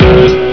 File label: Gtr, Instrmnt; Sound Library 2000; Wayzata Technology